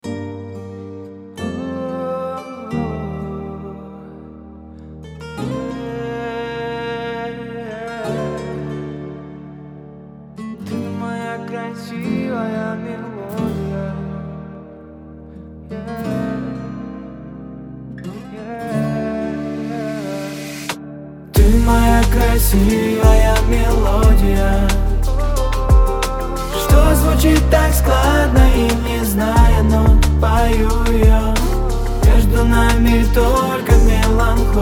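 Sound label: krasivaya melodiya, beautiful melody; Красивая мелодия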